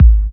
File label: DR. LOOP, DRUMS; X-Static Goldmine 3